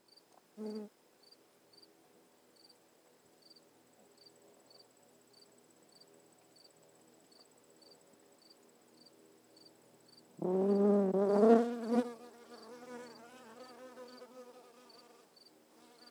Bee, Garden

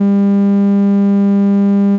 Rectification Fullwave

